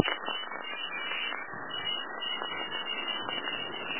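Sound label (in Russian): шейкер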